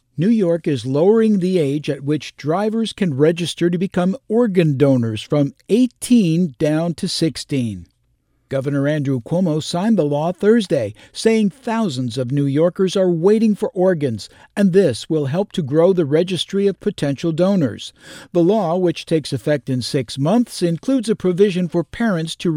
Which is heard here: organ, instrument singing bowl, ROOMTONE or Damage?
organ